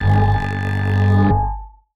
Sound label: Tractor; martyn stonehouse